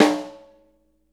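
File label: snare drum, JAZZDRUM, right; Ueberschall; Drum & Bass Flash Trackz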